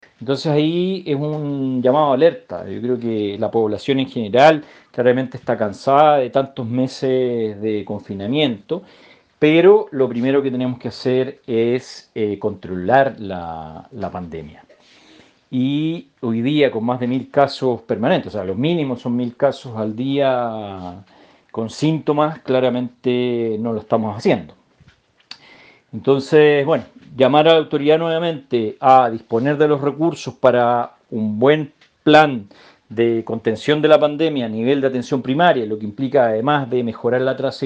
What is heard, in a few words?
BASS